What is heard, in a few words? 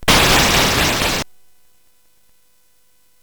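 Nebulus, MOS 8580 SID chip music, games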